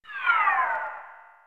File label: Falling; Super Smash Bros